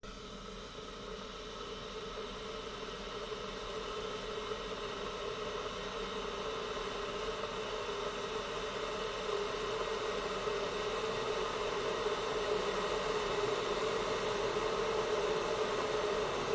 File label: kitchen